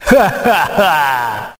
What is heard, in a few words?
ha ha ha, Voice ooh ahh